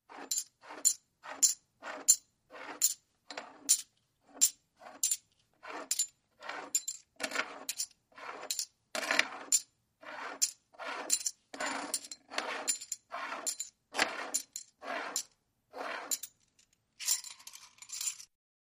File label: Coins Counting Sever